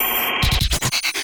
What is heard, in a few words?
notifications; RED Hydrogen-One